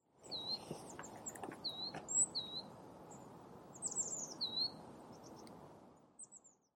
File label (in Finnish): kuusitiainen; kutsu; linnut